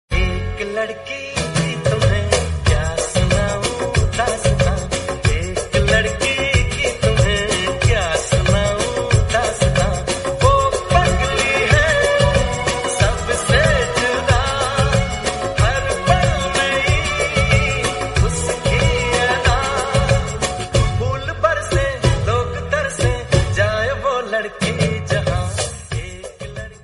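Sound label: Hindi romantic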